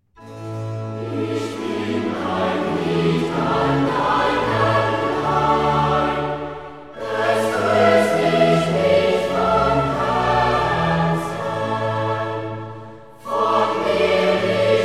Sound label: Coro; Ich bin ein Glied; Klassika; Handel Masterworks